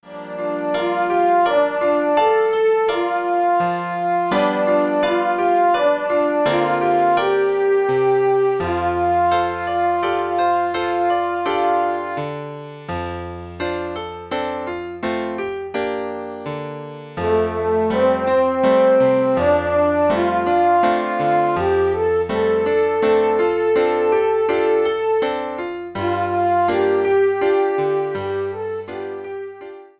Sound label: Melody Horn and Piano